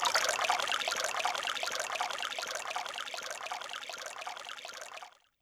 Steam, YAMAHA RM1X

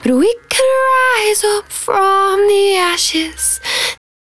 110bpm Ab, Velvet Vocal; Zero-G

